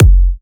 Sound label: Kick, Psytrance; One Shots